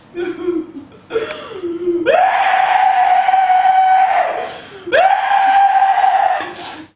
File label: scream loud